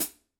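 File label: signature 12 combo crisp hi-hat chick, cymbales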